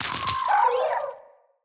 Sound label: Freeze Mote Vocal